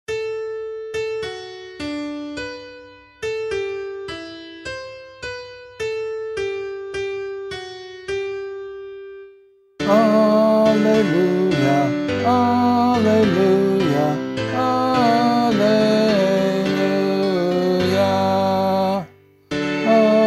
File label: Holy Family Gospel B - vocal, Gospel Acclamation